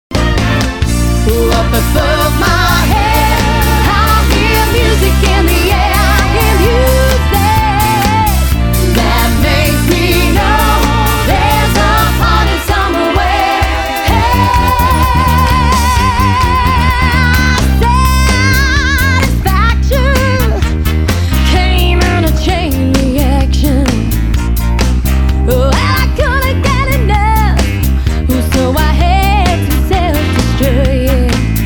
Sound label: Disco, 70s Theme Female Duo; 70s Showtime; 70s Fever